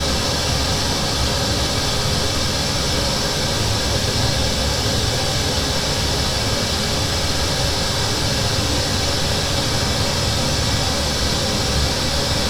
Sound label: Pink Noise; Corso acustica